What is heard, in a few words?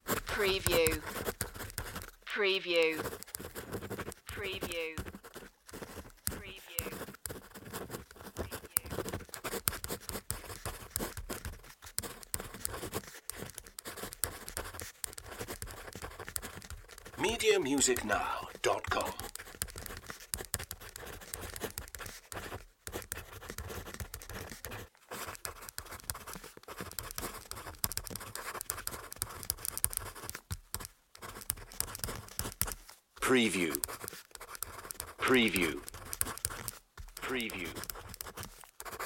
ballpoint pen writing